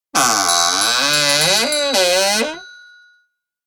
Old Curiosity Shop Door, Old wooden shop door creaks open with bell; Creaky Doors